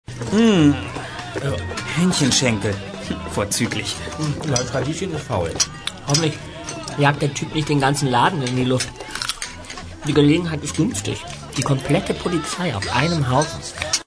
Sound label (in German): partylaune